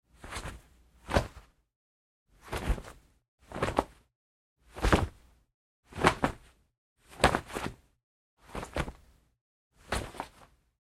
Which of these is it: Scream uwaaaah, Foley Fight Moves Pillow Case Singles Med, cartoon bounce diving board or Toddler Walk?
Foley Fight Moves Pillow Case Singles Med